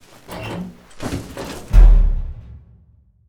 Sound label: locker door; alien isolation